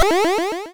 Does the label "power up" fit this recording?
Yes